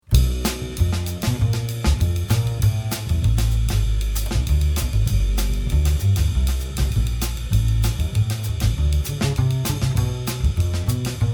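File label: Samba bass drums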